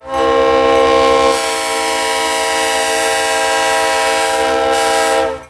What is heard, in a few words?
horn